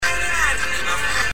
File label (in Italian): animale di plastica